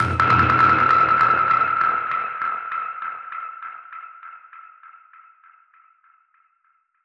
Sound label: Perc Hit, dub percussion one shots; musicradar